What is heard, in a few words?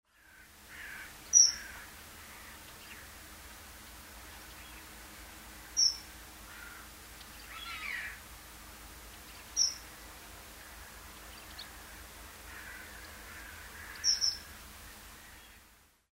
dunnock nocmig